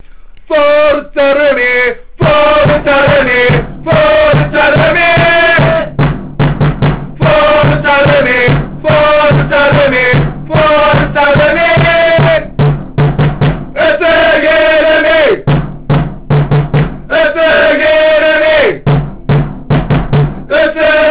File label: song